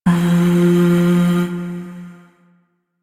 Void Shawzin Oct One F; Warframe; Zariman

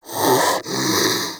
Armadillo Vocal Breathe; Characters; Warframe; Hunting Critters

